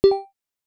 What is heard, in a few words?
NORMAL ALARM